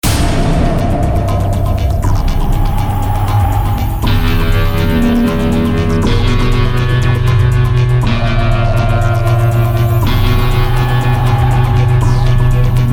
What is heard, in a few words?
italian splash; Gangland